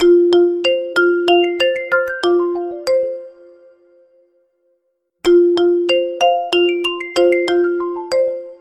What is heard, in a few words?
Vivo X30 pro Ringtone; ringtones